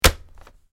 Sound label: Notebook Computer, laptop